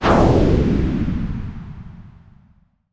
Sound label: Bullet Slowdown